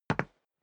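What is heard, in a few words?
step boots wood parquet single right, footsteps; schritte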